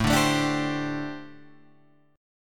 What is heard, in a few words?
G Suspended 2nd Suspended 4th